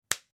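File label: sounds of a high five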